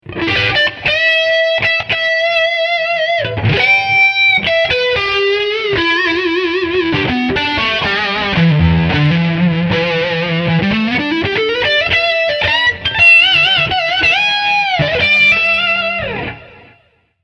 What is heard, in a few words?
Lick Front Humbucker